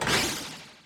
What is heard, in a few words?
Throw; super mombo quest